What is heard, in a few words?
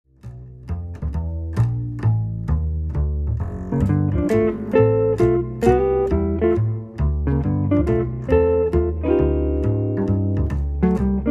Blues